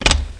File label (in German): Waschm